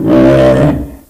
hunter found enemy ack, ministrider

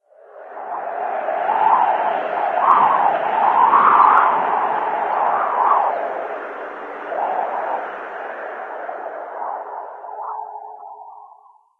thin wind gust; ambient; Left 4 Dead 2; Source Engine

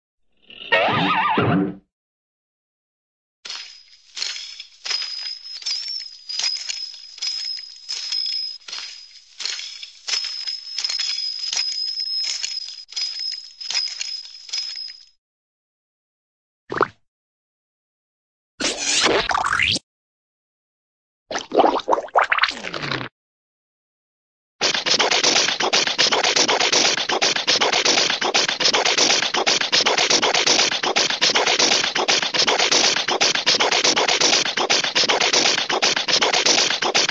spring, cartoon spring stretch and release

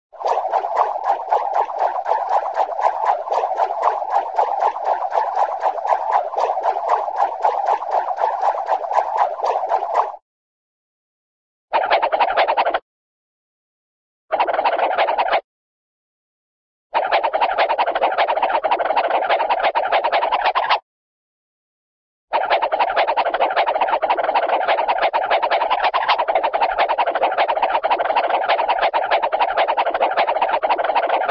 swish, cartoon rope twirling swishes

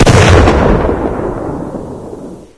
big gun, ambience

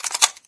ump45 clip in, weapons; Source Engine; cstrike

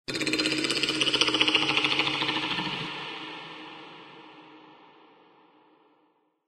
animal call hunter; Source Engine; tf; lair

